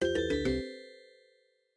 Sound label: MIDI Animation stings